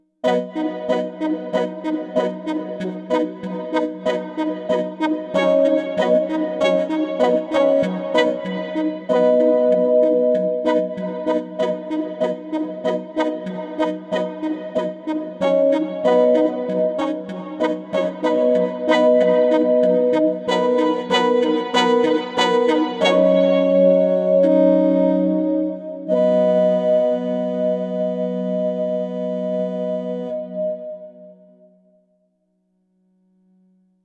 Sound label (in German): Striso board Jagdparty externer Hall